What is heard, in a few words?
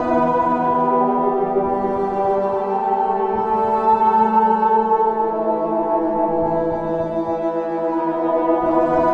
Ambient Melody; Drums & Drones